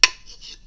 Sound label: go loud; browser go proto